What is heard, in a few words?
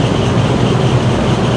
fan big; lander